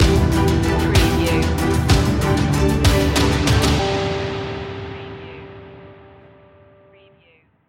dancing wind stinger

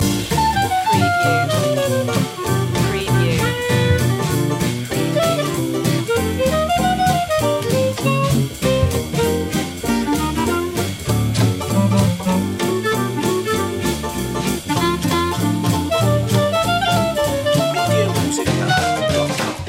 charleston loop

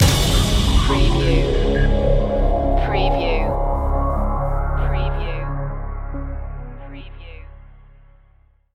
retro techno assault stinger

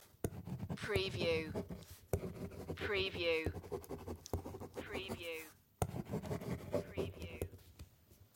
writing ballpoint pen

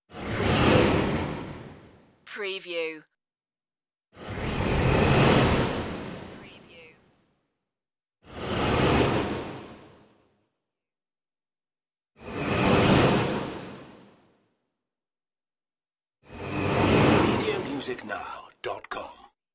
blow away whooshes

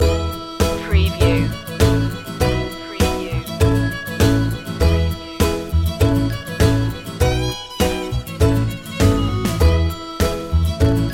country thing loop

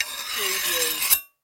Knife Scrape

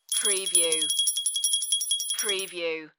Jingle Bells

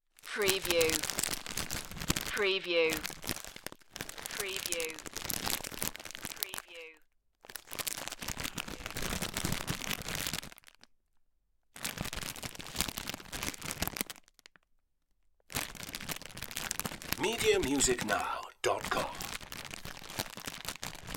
noodle bag rustle

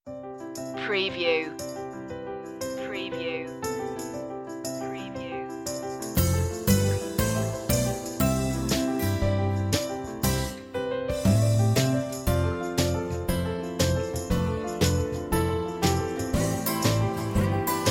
closer underscore

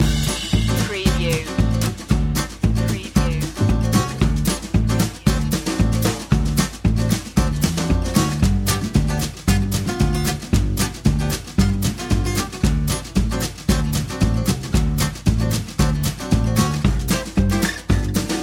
acoustic guitar bass and drums

